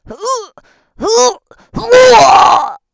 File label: Vomiting, no, Adult, Female